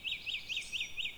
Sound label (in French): 4x tui, Oiseaux